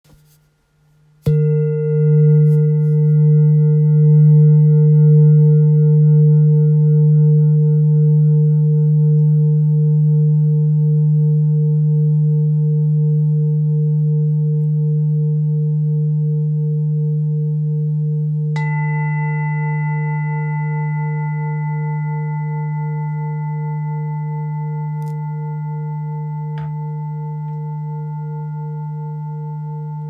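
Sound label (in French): Bol thick ré 2.22kg, Bol chantant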